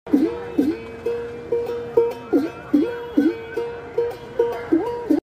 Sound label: Ektara